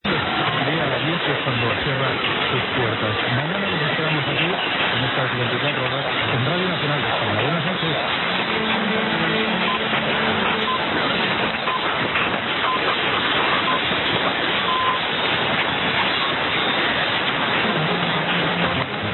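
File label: RNE Spain ID pips, radio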